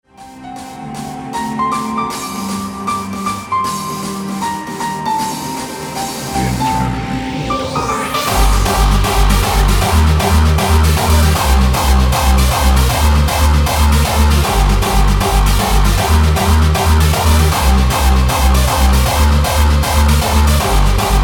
Jumpstyle

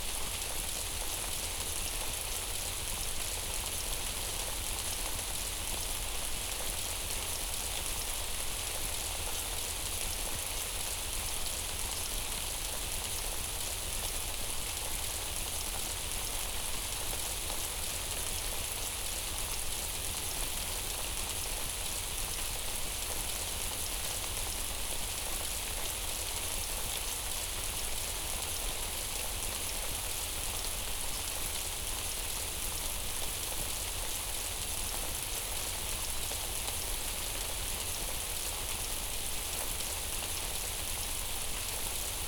Rain Sounds

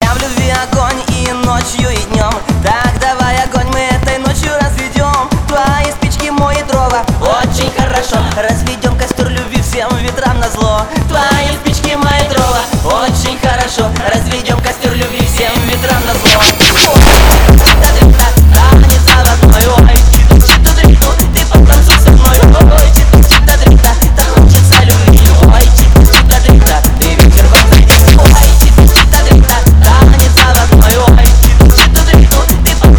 Phonk

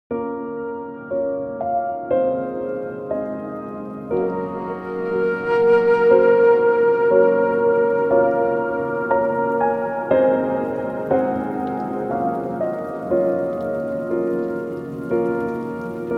Piano in the Rain

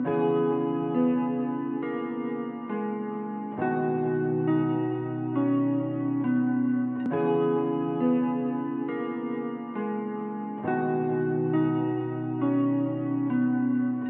Bass Boosted Beats Ultra Bass

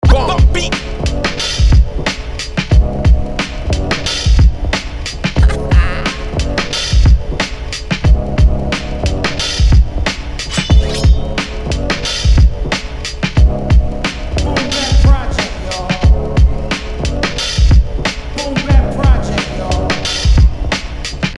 instrumental

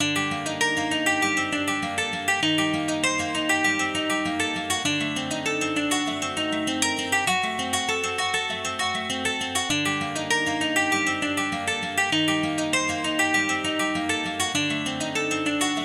Guitar Loop

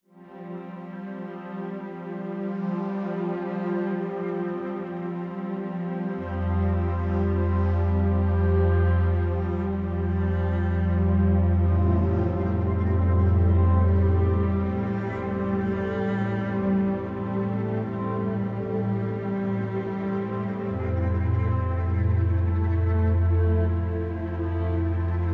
Cat Music Therapy

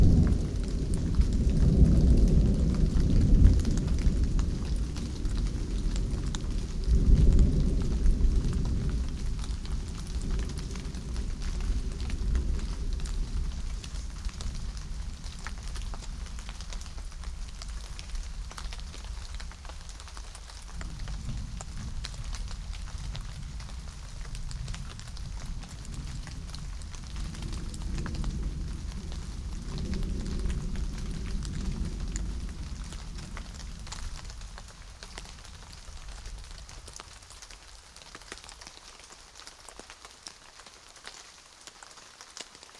Thunderstorm

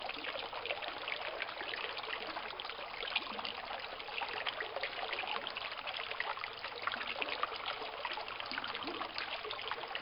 White Noise

